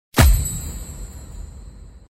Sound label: turntable getbonus